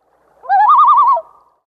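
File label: tremolo, loon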